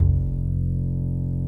BASS, 150 BPM; Ueberschall; Drum & Bass Flash Trackz

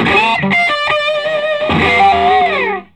SOLO, Vintage Blues Guitar; USB Soundscan vol.22

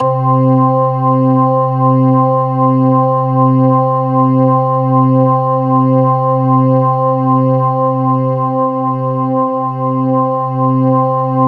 C3, synth; 90 s Sample CDs; NiceBeats; SynthLine VOL-28

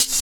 Hhat, Hihat; Equipped Music; Loungin House

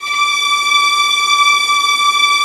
VIOLINS, Synclavier Strings; ILIO

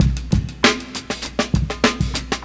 Funky Drum, breakz

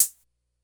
Closed HH, Drum Machines, Korg DS-10

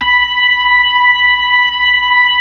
B-3, Brite Ch. SL; Roland LCDP10 Keys of the 60s and 70s 2

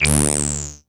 Reso Bass, Old Skool House; Big Fish Audio; OSH Bass

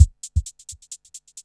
K LOOP, KICKS

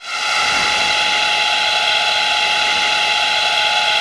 NASAL NOIZ; Distored Reality

